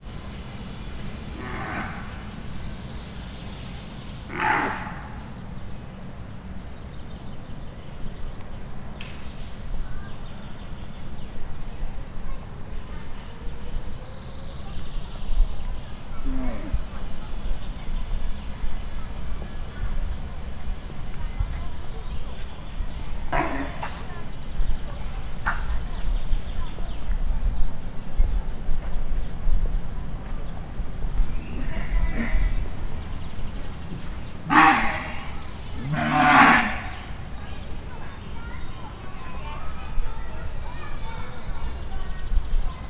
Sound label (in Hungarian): egyre közelebbről, kétpúpú teve; szegedi zoo 2011